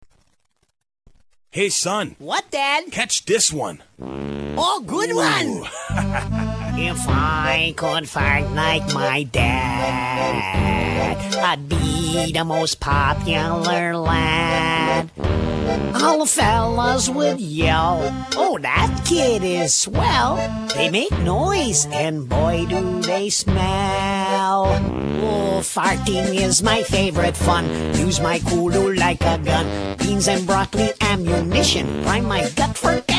fart; yoopers

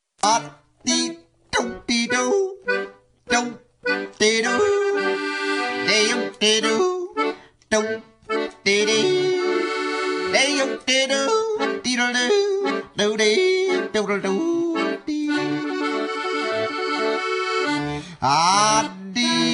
sourdough yodel

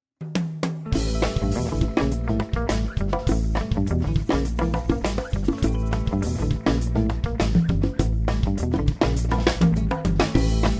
surf

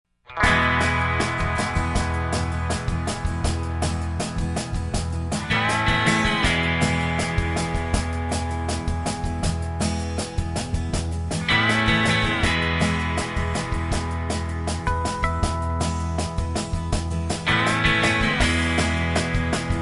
southern

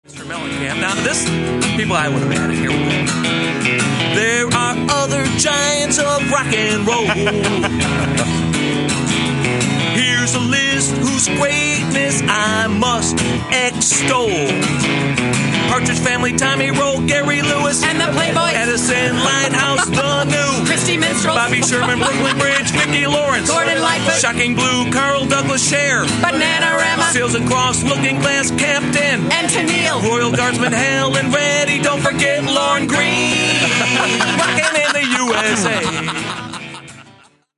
rock

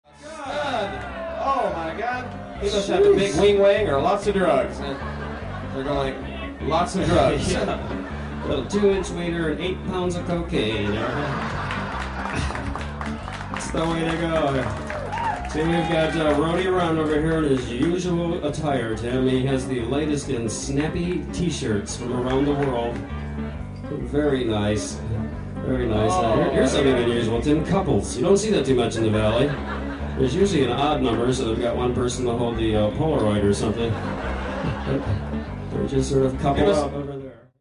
crowd

